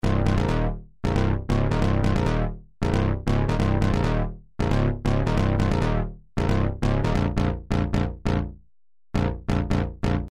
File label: bass analog; roland mvs1